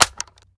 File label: ar akilo47 reload mag in; weapons; viper